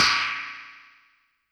Cymbal Synth; YAMAHA RM1X

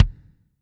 kick, Zoom MRT-3B

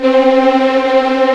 C4 TREM, VLNS TREMOLO, String; East West; The Ultimate String Collection

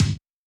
Boss Dr Pad Snare; 1987; Boss Dr Pad Sample Pack; 300 Drum Machines

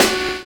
HIP SAX; Univers Sons

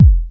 Deep Bass; Pro-Rec - Synthsonic

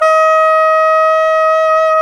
SAX SOP SAX, Soprano Long

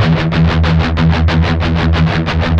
16TH, Guitars; Spectrasonics; Hans Zimmer Guitars VOL-1; MED 16TH

